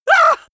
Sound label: male painful shout short; comedy-cartoon; soundpool